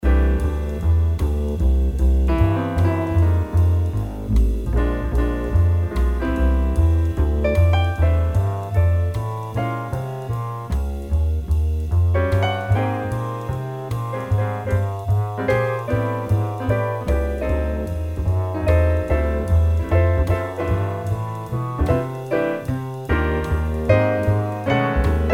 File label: Piano